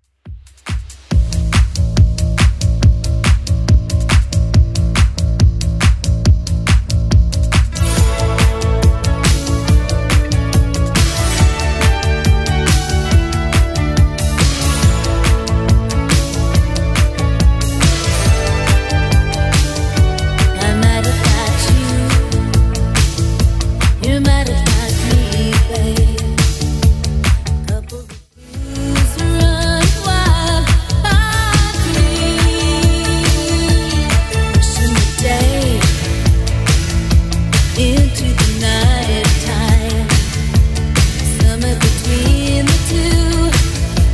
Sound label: 80s Club 140bpm